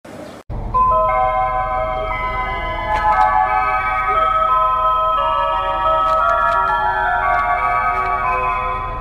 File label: Romania CFR Jingle